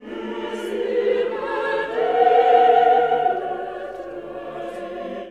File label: choral; webern; SDIF; ICMC2000